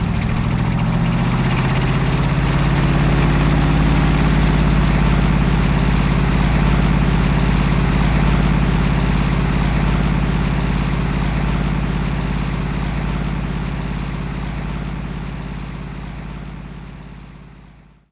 MAP MOVE CAR; maimai

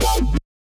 wobble

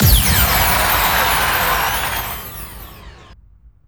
GAME TOUCH CENTER; maimai

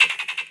bass3

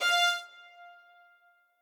string

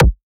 wooden kick, wooden door; Minecraft; block

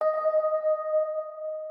organ

